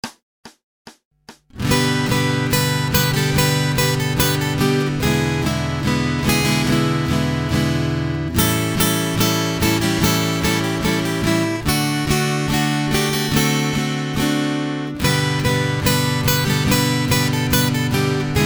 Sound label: Acoustic Accompaniment, acoustic guitar